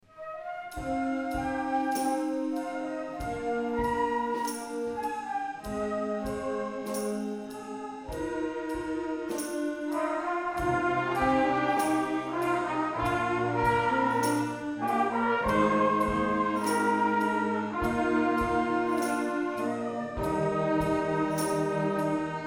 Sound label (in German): Schülerblasorchester